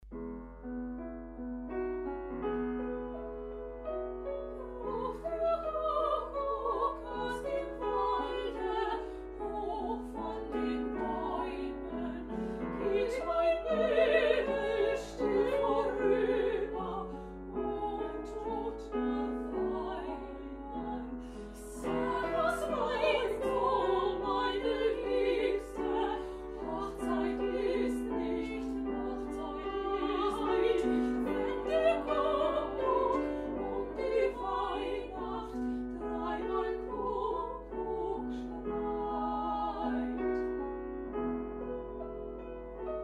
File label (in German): trio mit duetten